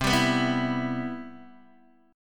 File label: C Major 7th, Guitar Open D